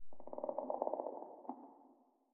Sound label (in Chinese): 嘎枝之心受伤传导声